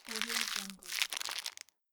candy bar packaging crush

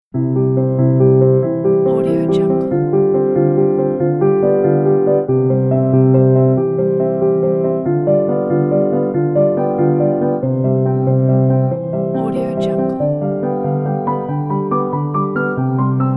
fast tender piano etude; animapiano

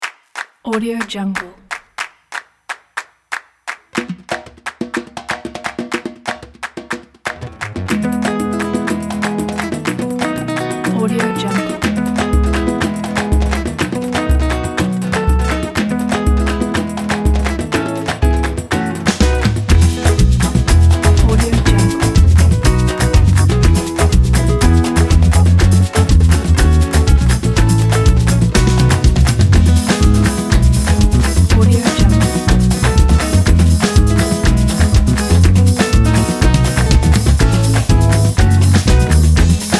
samba baiano; flaviocortizo

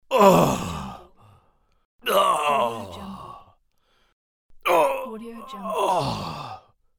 death breath

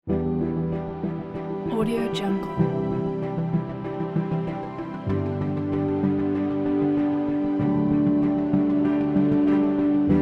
documentary series coming together

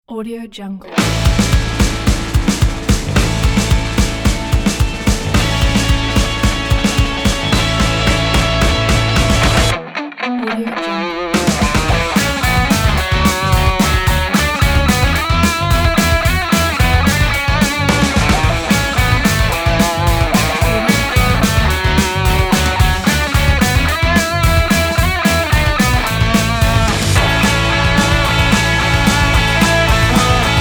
energetic jingle bells indie rock christmas

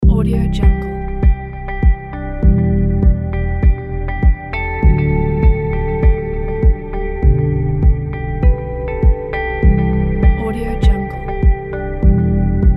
ambient beautiful kit; mary-studio

